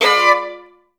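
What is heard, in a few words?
violins B3; Best Service; Peter Siedlaczek's Advanced Orchestra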